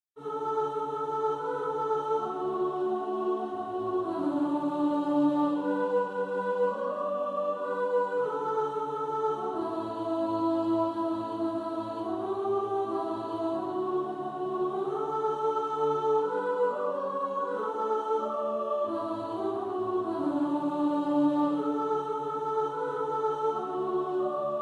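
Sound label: Who Will Follow Jesus soprano, choir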